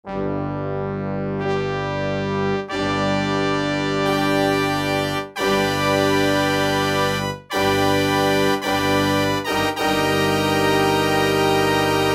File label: Orch. Brass, E-mu Proteus-1; Presets